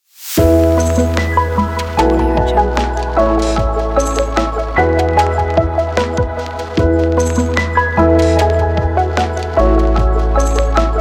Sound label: calm commercial ambient logo